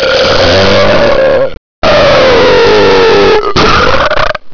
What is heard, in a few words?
aroma burp